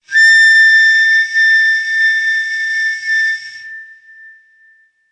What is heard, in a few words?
Tiz Gerdaniye, Ney